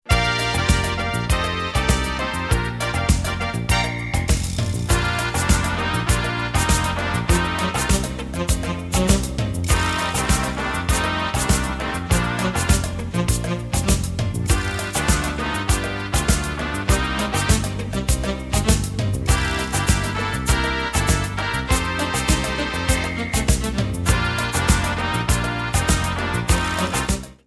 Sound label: Samba